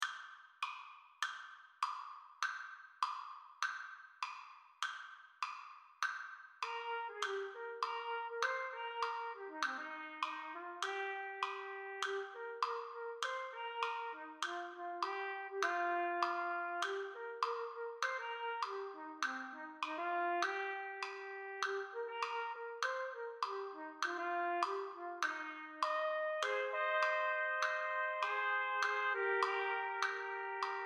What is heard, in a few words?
Bb Trumpet Slower Tempo; Trumpet 1 Only